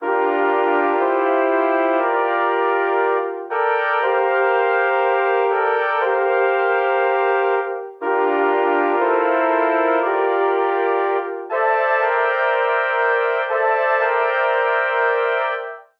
Phrase Veteran G Dorian 60 bpm, Brass Quartet; MIDI Clips; Ableton; Spitfire Audio

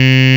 vox, Digital Synths; Ableton Foundation FreePacks

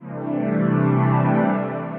Troposphere Pad, Synth Pad; Ableton; Beat Tools

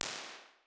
Reverb, Medium Rooms; Effects; Convolution; IR Samples; NI Kontakt 5

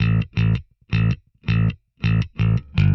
162 Indierock Bass Am; Rock Hard

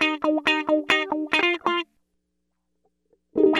133 Dance El Guitar Wah F; Dance DJ

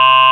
android, Digital Synths

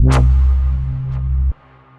Rectangle Reverse, Bass; Ableton; Factory Packs; Punch and Tilt

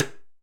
Timbales A L Stick, Latin Percussion, Drum Hits; Ableton; Factory Packs

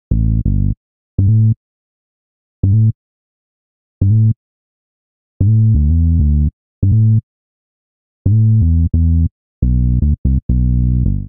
Beat That Bass A Minor 85 bpm, Bassline; MIDI Clips; Tonal; Ableton; Factory Packs; Chop and Swing

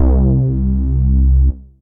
Deep Air Bass; Ableton; Factory Packs; Synth Essentials; Analog

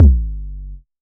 modular tonal, Kickbank; Ableton Foundation FreePacks

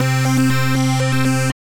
Rhythm, Wavetable, Synth Rhythmic; Instruments; Off The Rails Rhythm; Ableton; Factory Packs; Synth Essentials

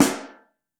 SN3 Mono, Snare

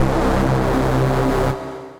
Bass; Ravage Bass; Vibrations by ModeAudio

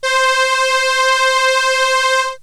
Solina Viola C4; Analog Synths; Ableton Foundation FreePacks

